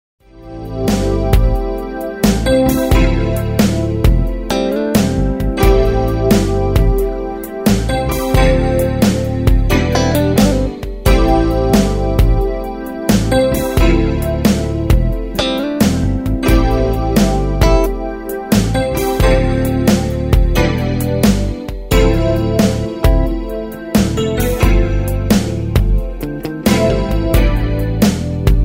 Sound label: Instrumental With Background